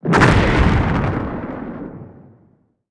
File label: explo large